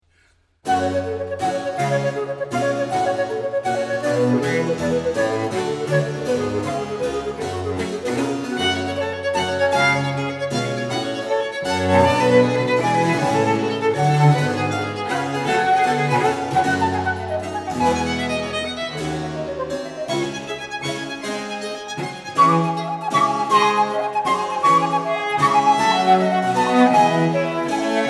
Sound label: Trio Sonata in G Minor for flute violin and basso continuo Allegro; TWV 42:g13